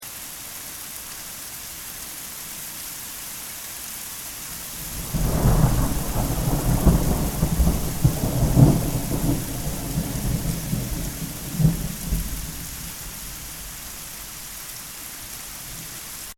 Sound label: Rain, Thunder; loop; Weather; Stuart Duffield